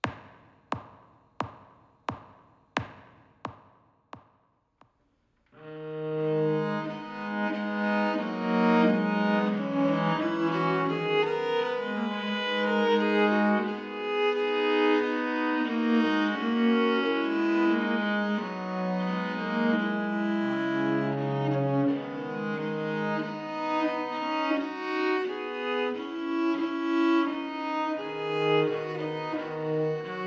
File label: Adieu Bass, viol; C non Papa